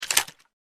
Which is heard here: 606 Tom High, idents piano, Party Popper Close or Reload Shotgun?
Reload Shotgun